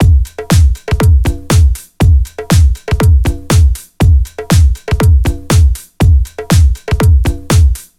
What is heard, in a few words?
4 floor beat, trance